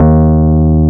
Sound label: PUNCHREEDS; Wizoo - Powered DX